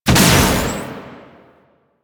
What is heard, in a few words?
Shot, Spear; Warframe; Weapons; Tenno; Priest; Scourge Prime